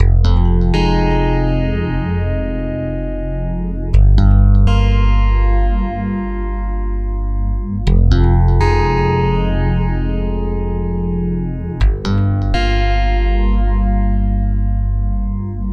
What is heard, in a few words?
Stick Loop, Stick Bass